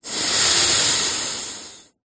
human male breath out close up through nose stuffy; zapsplat